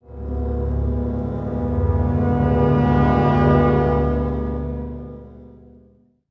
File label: brass accent; Orchestral Loops